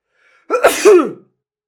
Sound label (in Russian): zvuk chihanija